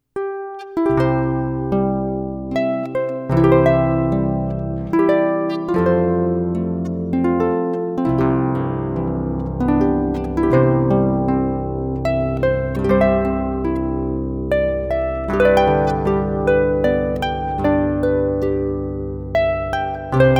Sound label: Amazing Grace American Folk Melody